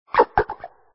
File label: stretchy, cry; pokerogue